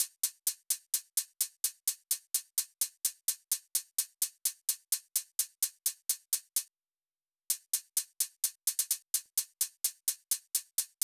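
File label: Drum Loop 128BPM hi-hat; VEC5; Drumloops; Multi Loops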